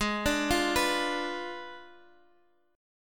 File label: G sharp Diminished 7th G sharp